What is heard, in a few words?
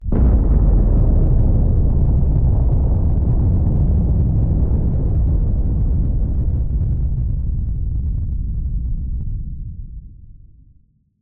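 Designed Nuclear Explosion; DavidDumais; Explosion SFX Pack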